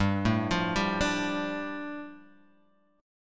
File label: G 7th Suspended 2nd, Guitar Half Step Down